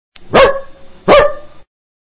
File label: Dog